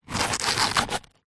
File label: foley leaf dry fallen thick palm tropical scrape concrete; zapsplat